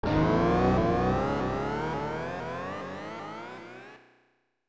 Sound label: Zoom In; SMW Remade From Memory 2021; Bowser